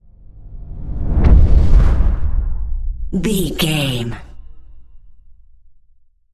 cinematic deep low whoosh impact

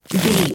bow crossbow arrow draw stretch

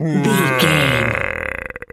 hippo groan

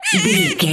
voice fun ant creature

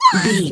voice fun creature small mutant voice emotes

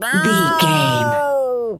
cat scream

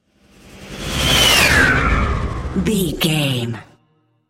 airy whoosh large

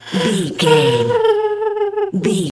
goblin fairy cry whimper high

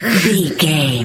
voice female growl grunt

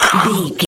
zombie voice hiss

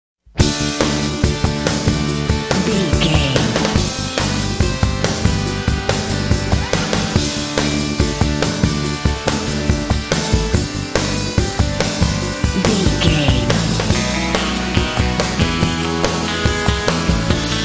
friendly teens munch

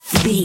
bullet impact snow

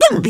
turkey ostrich hurt yelp

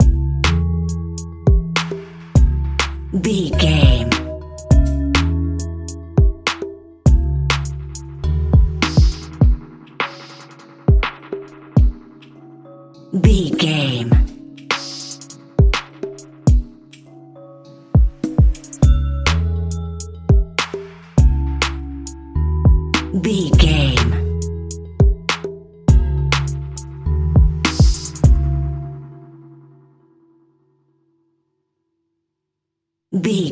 airy African hip hop; 30 Sec

